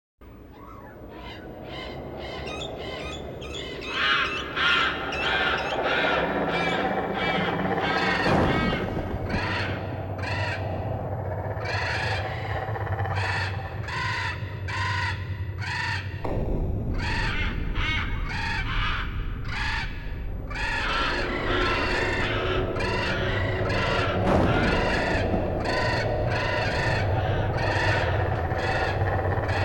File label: Crows & Storms; Dark Sun Wake of the Ravager; ms dos